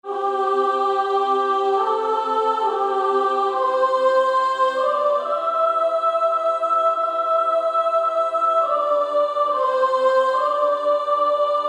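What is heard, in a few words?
Female Choir, soprano 1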